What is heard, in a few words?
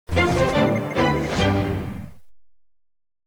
jingle; msnbc